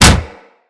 Kick Hit, Melee Impacts; Weapons

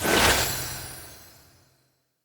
Polearm Multi Throw; Runes

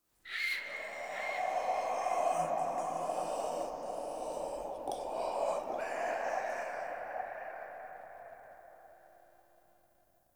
Room Whisper; Warframe; Entrati; Dead End Secret; Dante Unbound

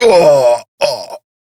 Death; New War; Kahl

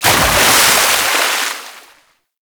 Undertow Splash; Pirate; Warframe

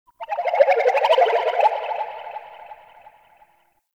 Ocarina; Warframe; Ambience; Nokko Colony

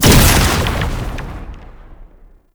quick strike cast impact; Roathe; Warframe; Tau; Abilities

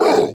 Pain, Barks; Kuva Lich; Persona A

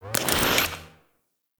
TV Turn On; Warframe; NineteenNinetyNine

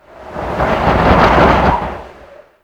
Mountain Pass In Ear Wind Gust; Ambience; Warframe; The War Within

